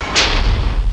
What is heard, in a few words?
bash; duke nukem total meltdown